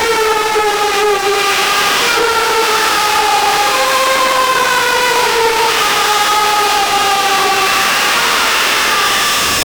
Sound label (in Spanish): caos desesperacion anarqu, chaos